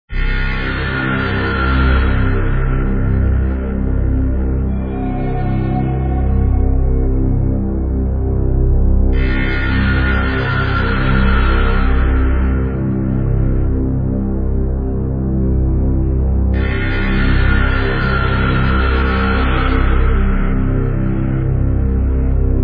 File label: tribal